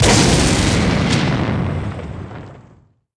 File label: Landmine; ASUS; P4B533; Intel 845E ATX